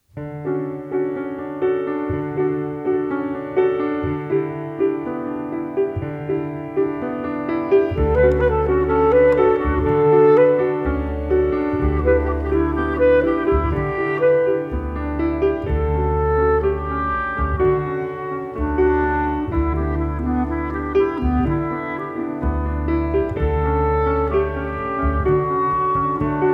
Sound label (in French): oiseau instrumental, Accompagnement; Accompagnement avec mélodie